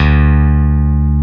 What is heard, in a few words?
PIA ELEC. D2, PIA CP-70; Best Service Gigapack 1